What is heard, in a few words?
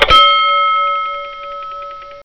Ring The Bell